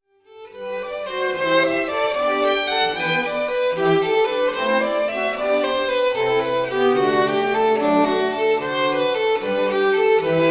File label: String Quartet